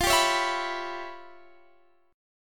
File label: E Minor 6th F; Em6/F; strummed